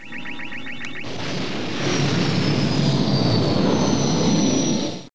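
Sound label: rocket, space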